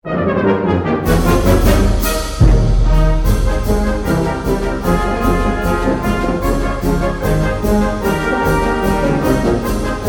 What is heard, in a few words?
March, Brass Band